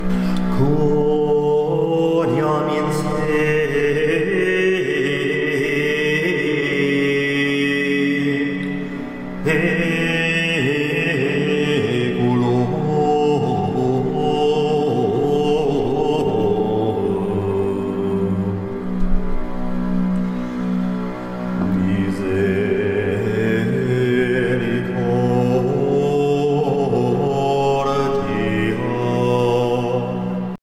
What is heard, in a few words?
Rhythmical Plainsong; choir